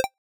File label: toggle on